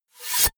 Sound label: sweep